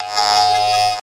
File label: Video Game Transiti